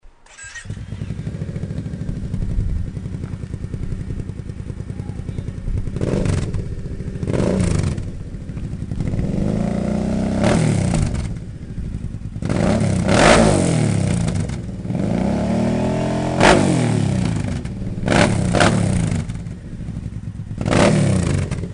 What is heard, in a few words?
k bforce sound, exhausts